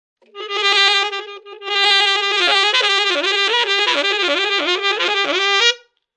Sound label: A crazy saxophone riff.; Horn Sound Effects; Musical